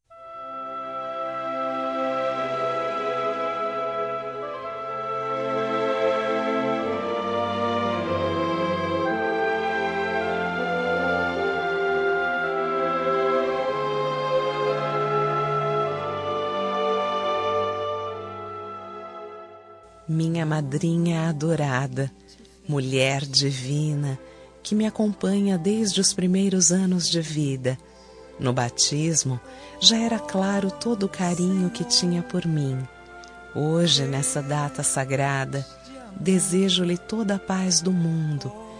Noite Feliz, feminino; Natal para Madrinha; Datas importantes; Natal e Ano novo; Natal e Ano Novo Variado

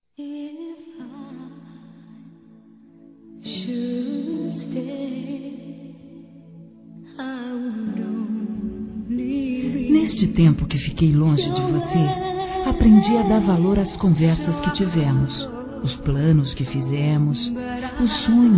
Conquista Ex. Esposo ou Namorado Feminino; Conquistas Cantadas paqueras; Telemensagens; Telemensagens 2017

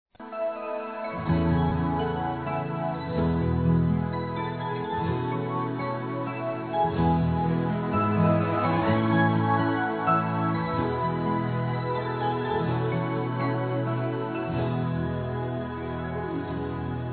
Dá Uma Oportunidade FEM; Conquistas Cantadas paqueras; Telemensagens; Telemensagens 2017

